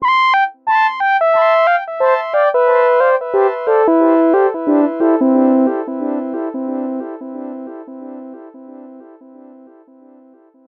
Old Synth; сэмпл синтезатора; легкая музыка

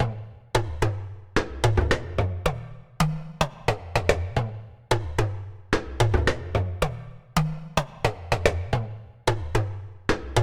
Talking Drums 110 BPM; барабанов

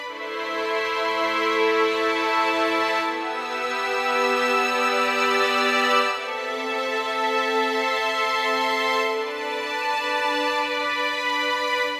Violins ens; Сэмпл скрипки для фильмов: Violins Ensemble A; film music; Strings; Музыка для фильмов; скрипка; Movie Score; 80BPM

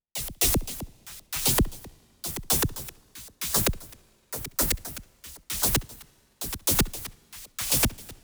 Old school, electro pop

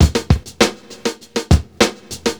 Drums A, funk; сэмплы ударных

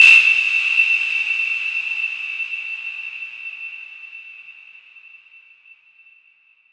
Big Hall, техно транс

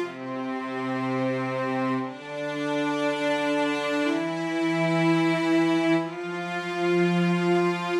Cello Legato A

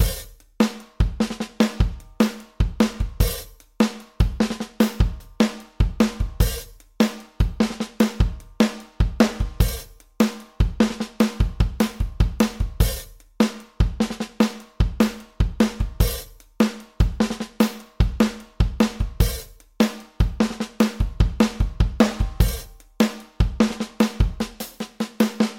Master Funk Groove, groove

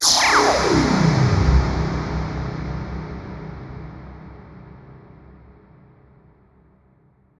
Laser; 90’s Eurodance

